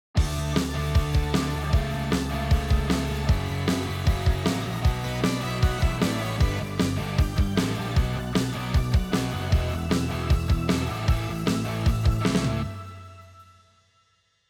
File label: tone centric on